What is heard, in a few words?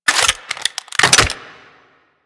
Reload; Grn Ogris